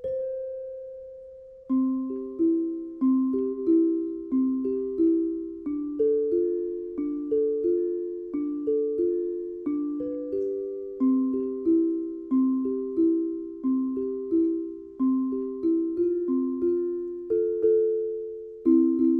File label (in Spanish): Metalófono Contralto; Gatatumba